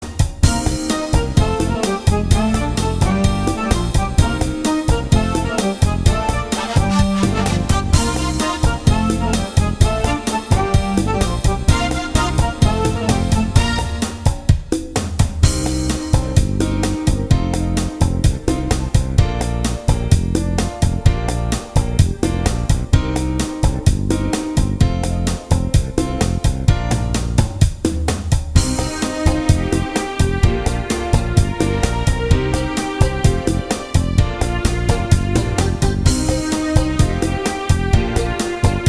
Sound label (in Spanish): cha cha cha